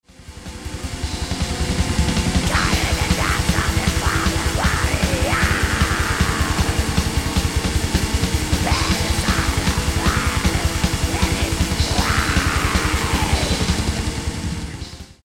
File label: Vocals